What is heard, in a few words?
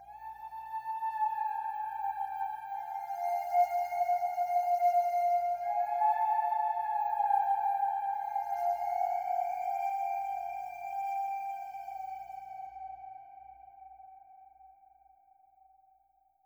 keys distant whales, sad loops